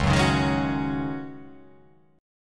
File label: Db Suspended 2nd Suspended 4th, guitar chord; strummed; JGuitar